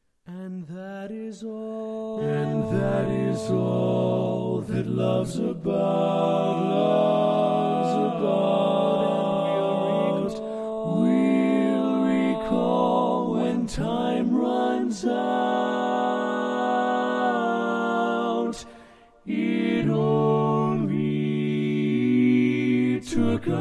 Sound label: Bari, Barbershop Tags